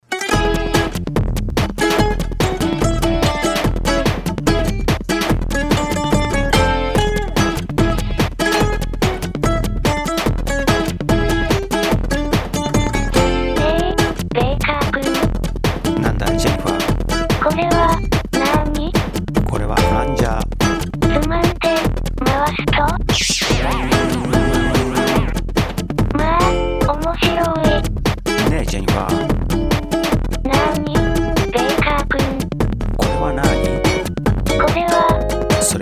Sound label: Blast Flanger